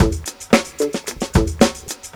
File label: breaks; breaks05